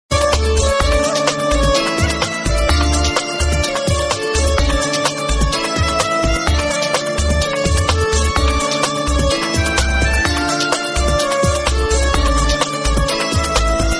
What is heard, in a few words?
Hindi Old